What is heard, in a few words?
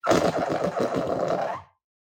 Fart Noise; SoundsCrate